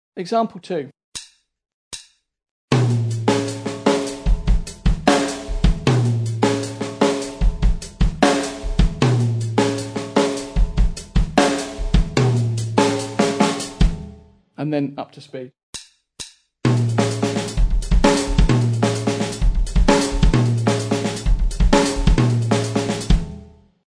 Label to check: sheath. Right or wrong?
Wrong